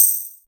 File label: SHAKE HIT, SHAKER; Drum Hits